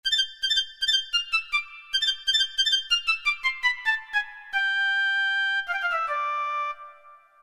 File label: Piccolo, Roland MT-32